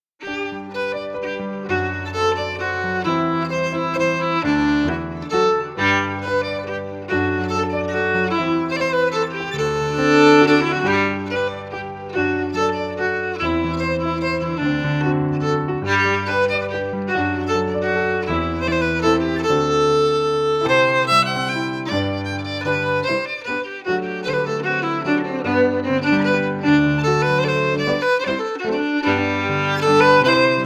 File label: FIDDLE FIDDLE